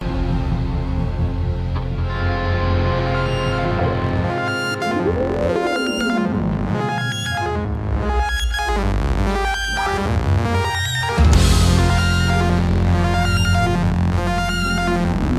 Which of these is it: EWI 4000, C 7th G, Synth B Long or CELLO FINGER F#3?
EWI 4000